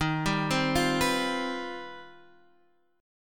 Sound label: C Minor Major 11th; arpeggiated